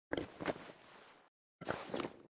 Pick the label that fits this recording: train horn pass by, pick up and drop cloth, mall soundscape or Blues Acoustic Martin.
pick up and drop cloth